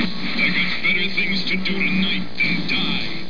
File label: die; STC MILLENIUM